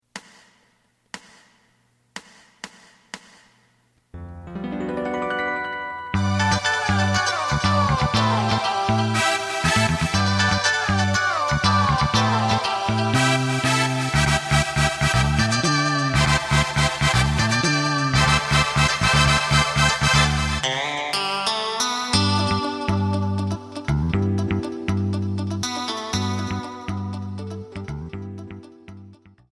drum

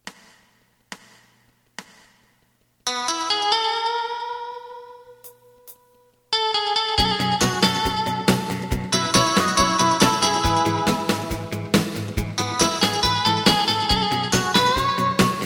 bass; エレキバンド用カラオケCD

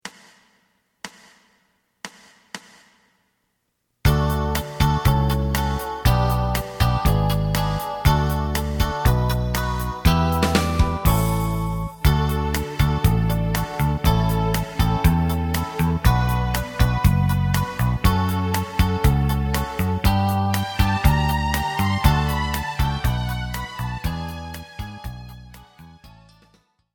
lead

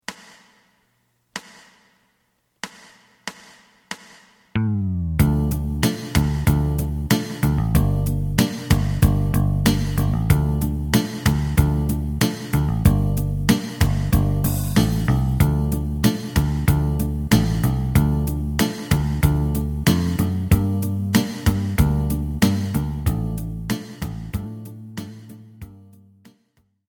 lead

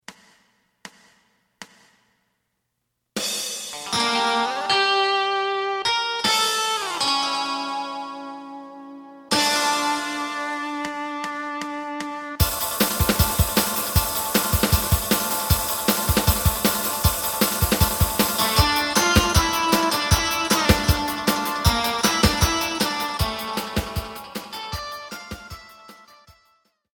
bass; エレキバンド用カラオケCD

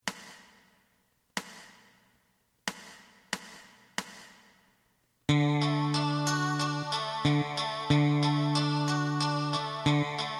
lead; エレキバンド用カラオケ

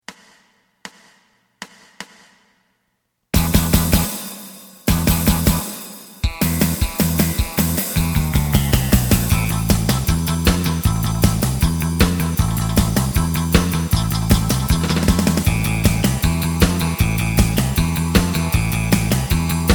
lead; エレキバンド用カラオケCD

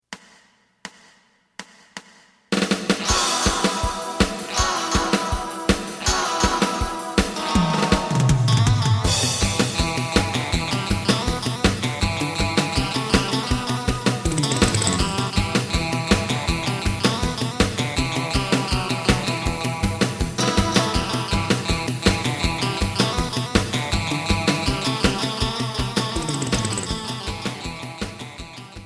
bass

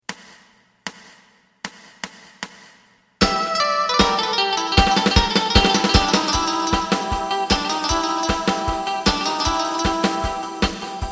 TWO GUITARS bass; エレキバンド用カラオケ

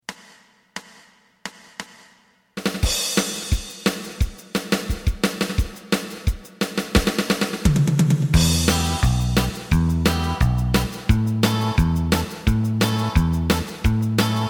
lead; エレキバンド用カラオケ

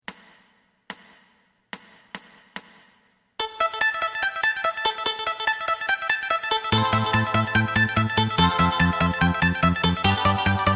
drum

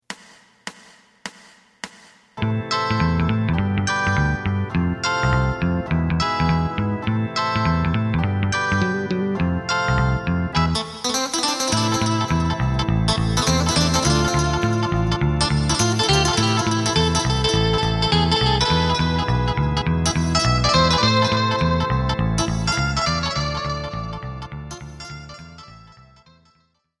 drum

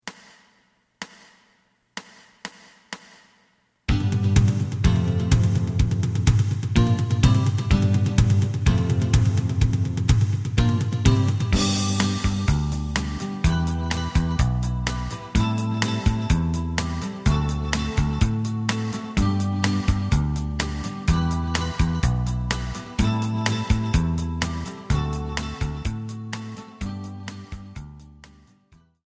lead

